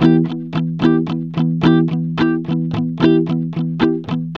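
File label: ROCK E flat minor 110, Vintage Blues Guitar; USB Soundscan vol.22; AKAI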